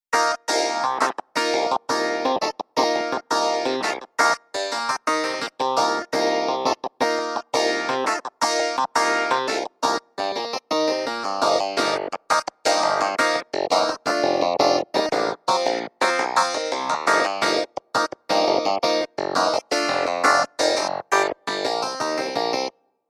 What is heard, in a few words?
Black Cow Clav; Classic Keys ROM Option